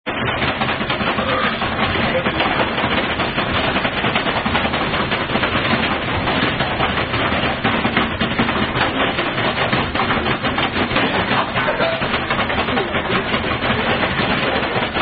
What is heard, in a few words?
Drinking Game; Thumper!